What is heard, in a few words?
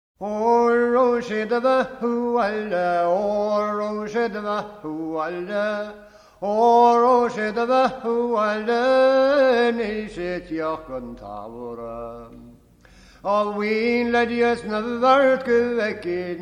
traditional irish unaccompanied singing; oro se do bheatha abhaile